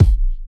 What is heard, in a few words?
puffy bass drum one shot a sharp key; Duration 0:01; kick drum samples